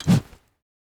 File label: salvaged hammer attack; Rust